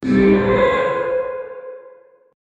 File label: game over wasted dying, game over